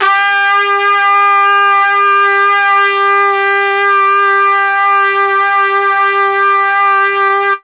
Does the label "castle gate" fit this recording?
No